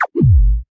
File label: Menu Escape; Custom UI Sounds; Slime Rancher